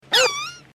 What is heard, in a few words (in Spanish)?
perro ladra y chillido